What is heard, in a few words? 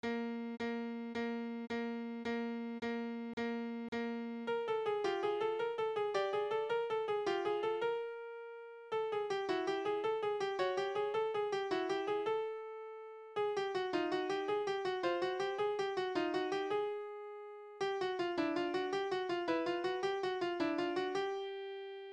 Lip Slur Chromatic Warm Ups